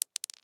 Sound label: Foley Toy Marble Drop Single